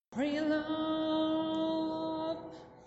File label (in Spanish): coro voz